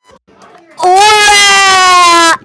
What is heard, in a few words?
auraaaaaaaah